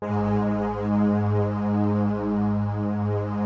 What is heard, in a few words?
G#1 ORCH V, BIG ORCH VOX; AKAI S-Series CD-ROM Sound Library VOL-7